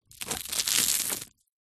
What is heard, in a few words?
toast cut with knife